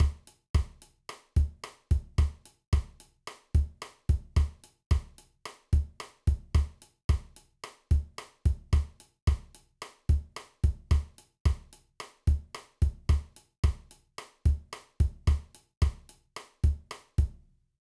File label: Motown Groove